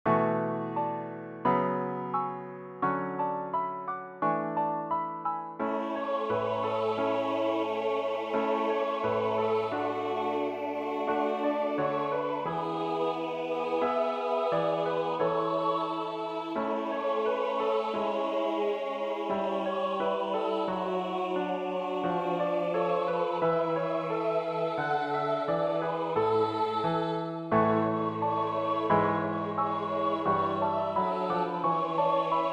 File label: jingle bells; pierpont james